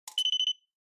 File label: Ticket Gate Beep Tone1